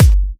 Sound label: Kicks; Vengeance Samples